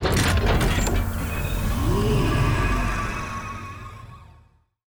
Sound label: relic preservation; Stellaris; grand archive; relics